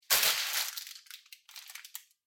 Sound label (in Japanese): まな板に食材を置く音